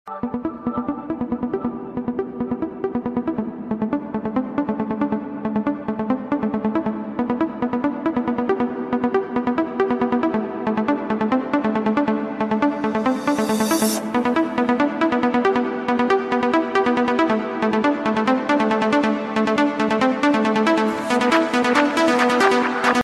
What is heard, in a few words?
electro piano ringtone